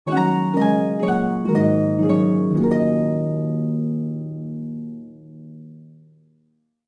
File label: Greece Music Short; Civilization Revolution